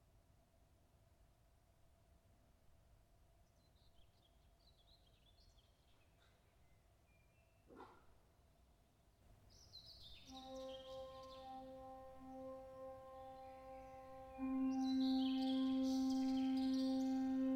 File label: birds; Meditativ musik